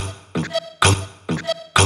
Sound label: House Loops, Vocal Loops House 128BPM